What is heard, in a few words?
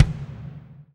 BD, DR BD; Best Service Gigapack 2